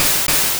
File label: Twin Needle; Pokemon Essentials; PKMN Estelar 1.3